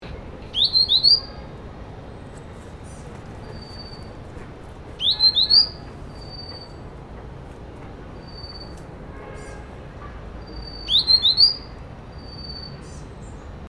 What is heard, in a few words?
Grey headed Canary Flycatcher Ruff Warbl Pingwu Sichuan; China 2011